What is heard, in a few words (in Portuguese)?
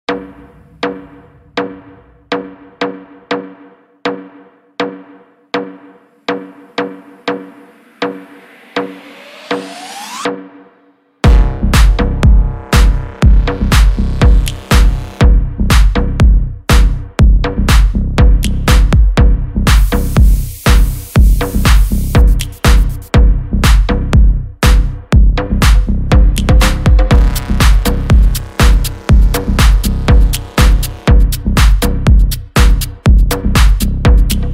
energy vibe eletronic music